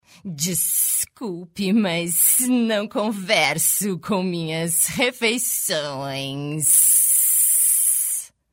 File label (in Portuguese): Cobra